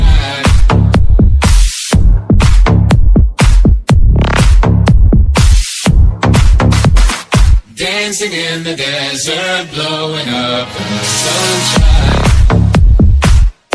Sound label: english remix ringtone